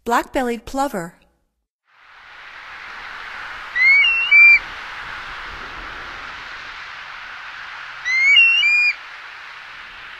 Black-bellied Plover, Songs of Birds of North America; Stokes